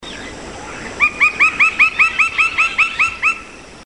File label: lineated woodpecker, Belize birds birdsongs